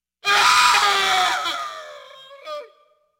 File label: Man Screams Terrified; Halloween Havoc - Sound Effects; Ktel